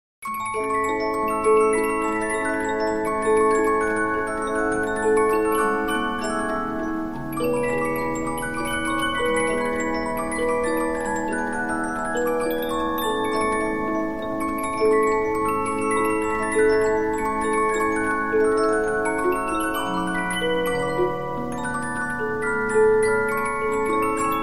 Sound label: Polyphon, 15inch disc musicbox